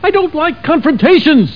Sulk

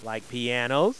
STRING

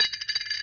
cash; cowboy casino

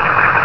Diesel; TheyHunger3

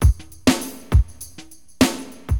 Drums, Acid Jazz Funk; LiveAct Pool

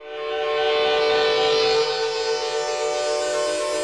SYNTHPAD HOUSE 125 A; eJay; Sphere

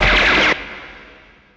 Slam; ImpGal2; groundwar

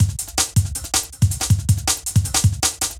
DRUMLOOP D+B 160, Drum Bass Loop; eJay

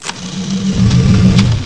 Geo Game Lower Screen Up; Let's Discover Texas!

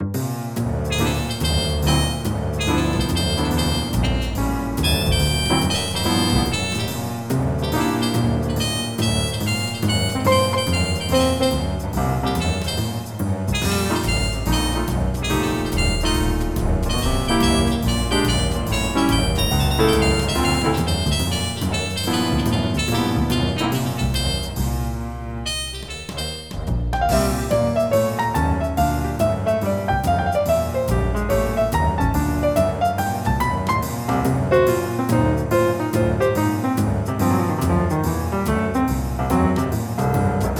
jazz; MIDI Music File

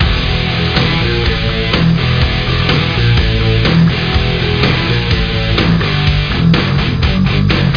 BARMUSIC

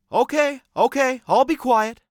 ghost Whining; Keepsake; english; basement; ghostWhiningFiveZ3

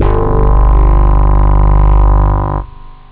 lo synth

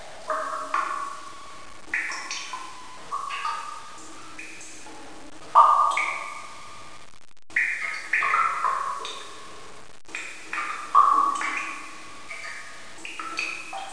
water room; SecretsJ DEMO

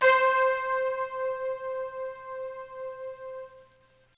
Hybrid Keys; Dance

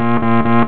Warning; Deco 1.0

